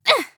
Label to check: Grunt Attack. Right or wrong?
Right